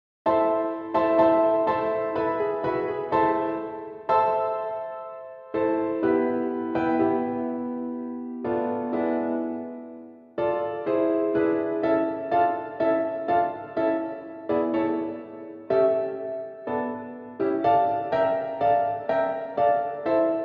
Accompaniment Track; Womens; Robert Schumann, ed. MacMullen

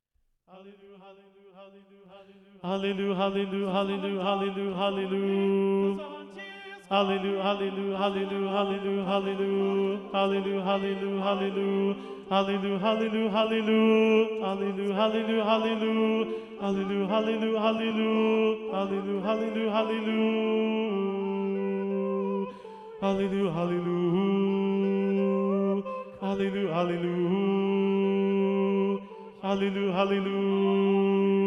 SATB Bass 1 Predominant; Mixed

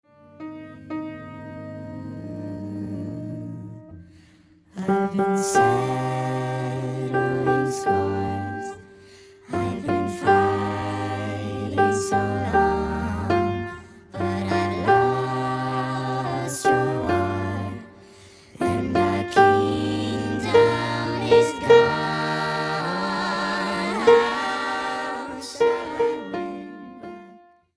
Soprano Call Back Cut; jazz

